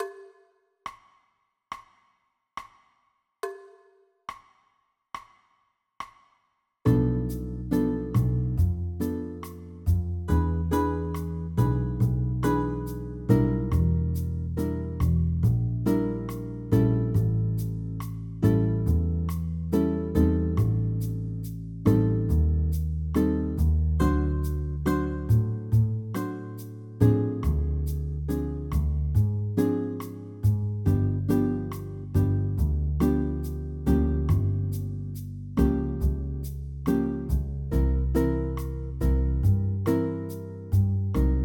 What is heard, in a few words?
minor II V, jazz piano